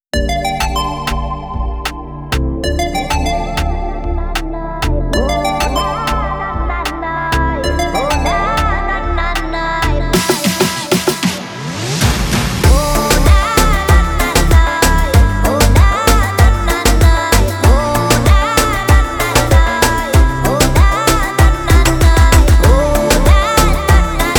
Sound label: reggaeton tropical; By Burak Sound Bank; reggaeton tema peketleri vol 3